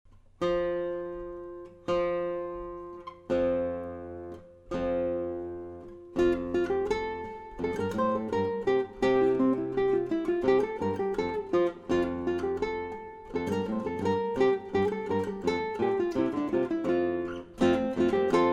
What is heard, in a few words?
3 Country Dances; Tielmann Susato; Arr. Michael Rondeau